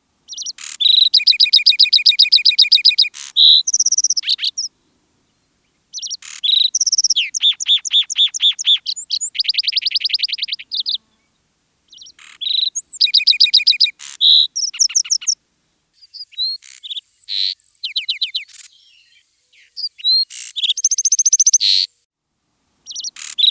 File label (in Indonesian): suara lark sparoow